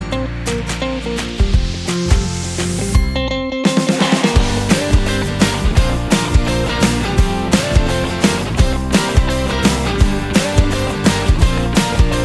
Upbeat Fun; MIUI12

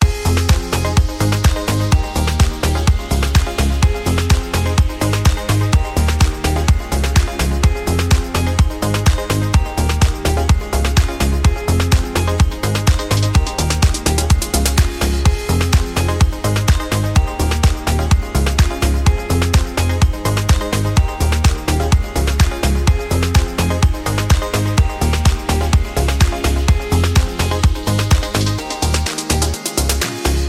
Upbeat Summer Pop; MIUI12

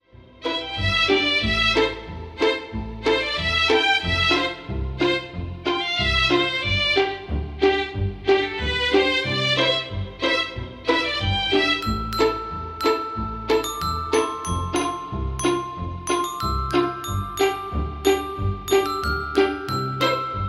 Jingle Bells; MIUI14 and HyperOS 1.0

